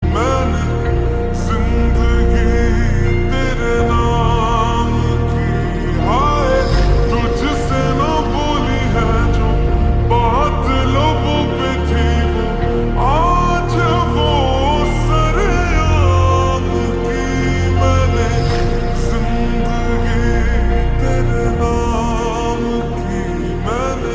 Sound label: Slowed Reverb